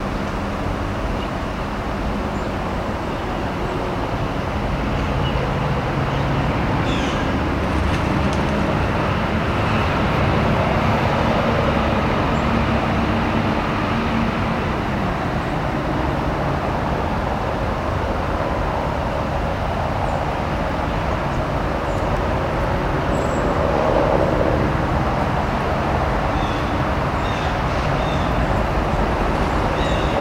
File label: ambience park north traffic aircraft; central park north nyc